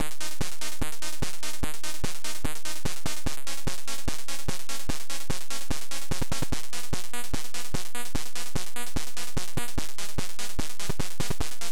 1 Bit Chase; TS 2068 Demo

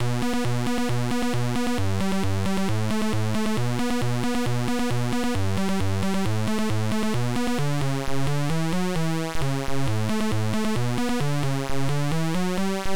Title Beeper; Steve Turner; Flying Shark

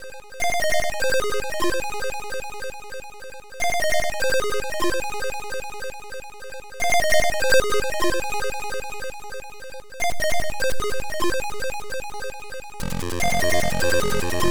Weird pop; Bell

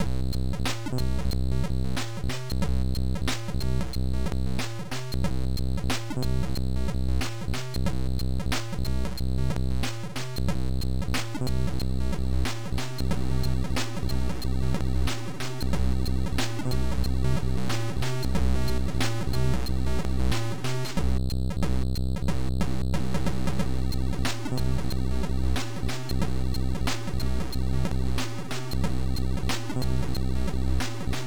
Jazz

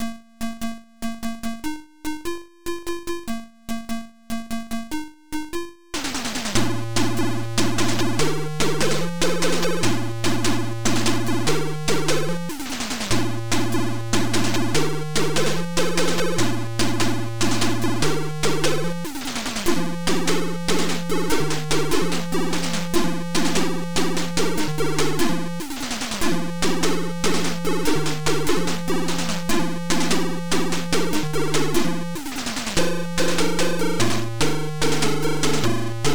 happy ritmation

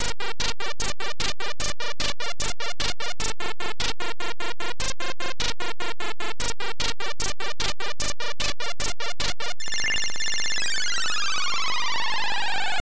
Title Beeper; Transmuter

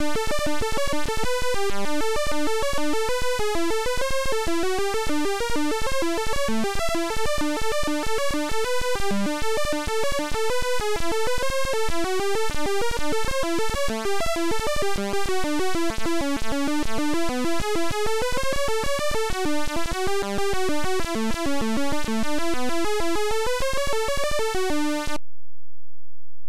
Title, Beeper; Rana Rama

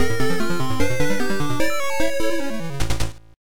game over; Waterworks 2

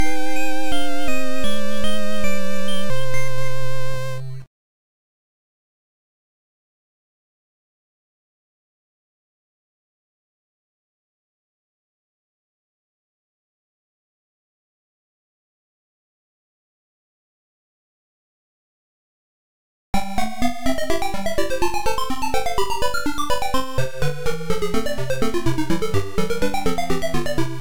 game over music

